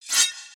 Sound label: Thwok slash; Summoner